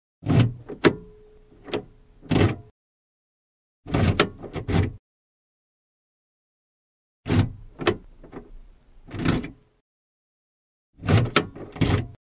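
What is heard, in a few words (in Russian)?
открытие и закрытие двери в машине ключом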